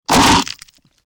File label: hit, troll